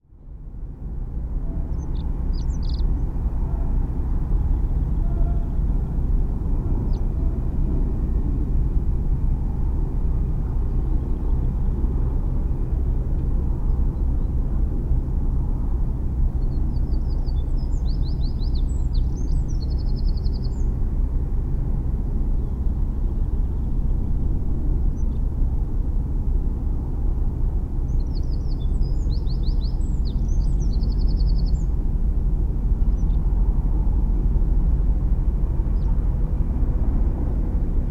building work starting next to Binckhorstlaan. Geese flying over.; Early Morning work; excerpt from SoundUP stream curated by Slagroom